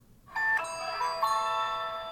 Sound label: Magic Band Scanner Sound; Disney Magic Band Scanner